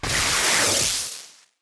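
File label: impact bullet energy titan shield arc; Titanfall 2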